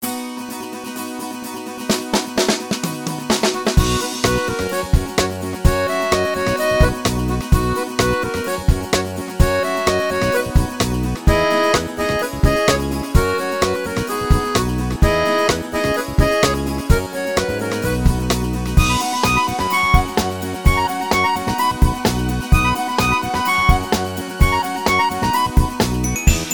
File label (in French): voici le mois de mai instrumental